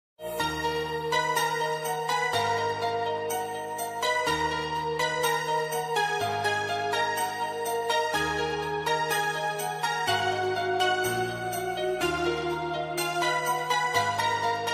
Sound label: instrumental ringtone flute ringtone